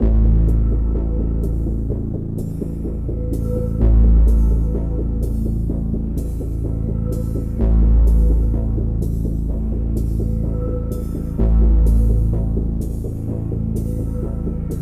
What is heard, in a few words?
Tension; Schlag den Raab Das 2. Spiel; 3DS